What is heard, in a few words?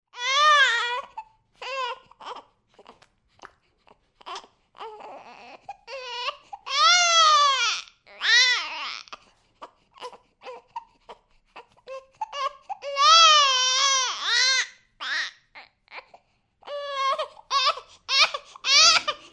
Baby crying; Sony Ericsson; W20i Zylo